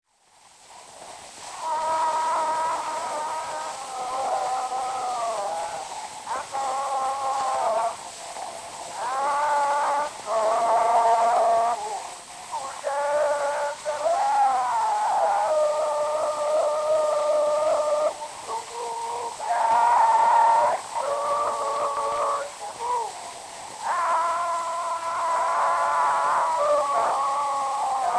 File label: Zulu Recordings; Father Franz Mayr; Ihubo lenkosi